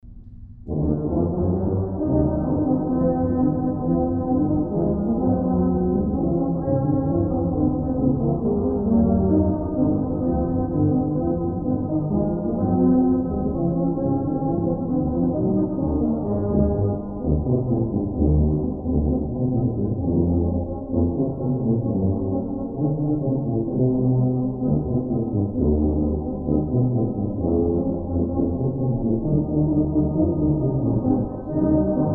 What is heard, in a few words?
Jingle Bells, Christmas Tubas